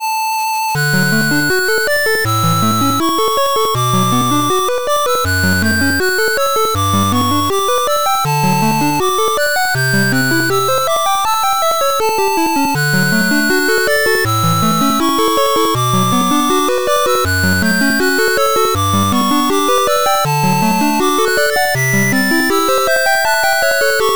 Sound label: 8bit Wings Of Freedom